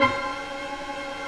WINDS; Roland - LA Composer vol. 1

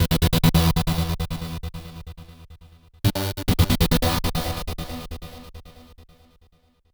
Progressive Synth, Trance

